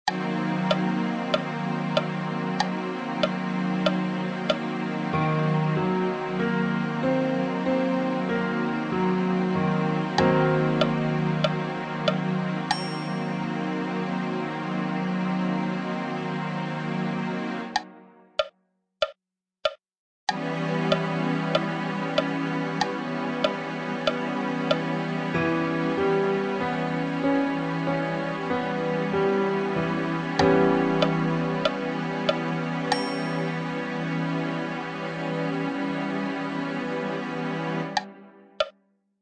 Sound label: min7b5 inv, ear training